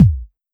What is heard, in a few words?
soft hit whistle